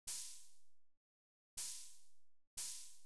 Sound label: Cymbal; hydrogen